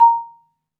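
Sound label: MARIMBA C6; Synclavier Percussion; World & Orchestral; ILIO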